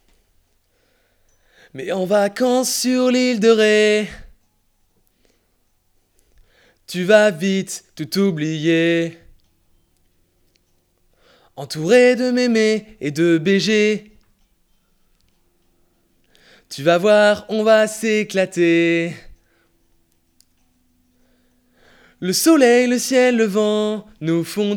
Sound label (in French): Voix principale